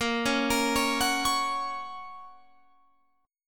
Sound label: A sharp Minor Sharp 5th, down, Guitar, Open F; arpeggiated; JGuitar